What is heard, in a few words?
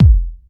kick single shot f key, steel kick drum